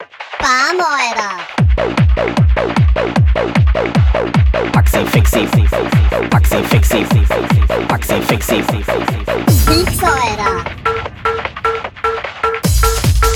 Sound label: schranz pitched version